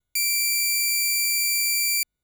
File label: Pulse B D6; fleet keys Samples